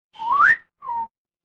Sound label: cartoon slide whistle